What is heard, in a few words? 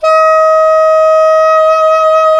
SAX SOP MF, Soprano Long; Roland LCDP07 Super Sax